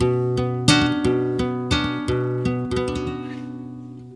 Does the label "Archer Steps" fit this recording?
No